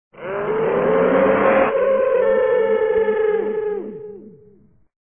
scream

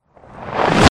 gun, reverse

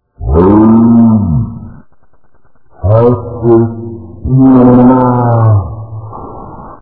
train brakes

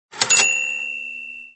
cash

